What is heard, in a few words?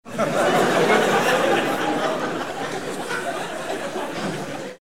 laugh